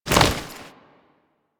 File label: Hoverboard Land; Warframe; DE; Vehicles; Venus